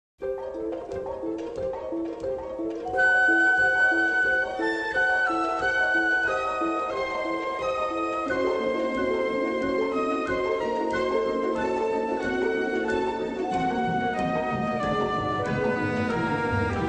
DIAMOND SNARE DRUMS

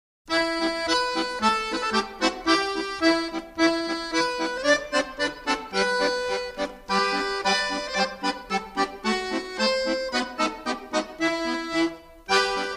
accordion parts

